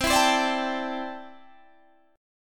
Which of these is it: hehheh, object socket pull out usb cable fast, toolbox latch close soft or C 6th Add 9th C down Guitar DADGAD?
C 6th Add 9th C down Guitar DADGAD